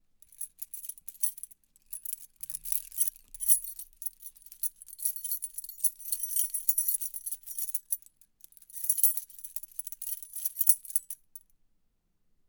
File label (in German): rode nt-usb schluessel